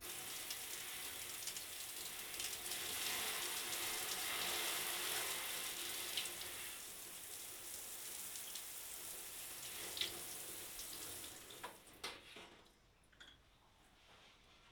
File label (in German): dusche; klang im schnee